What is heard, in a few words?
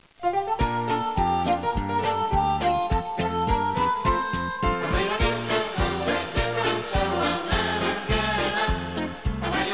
Quickstep